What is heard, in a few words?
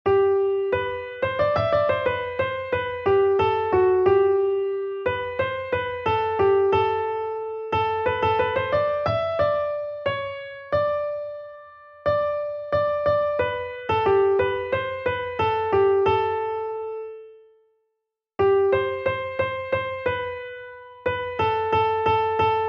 melody; o sing a new song to the lord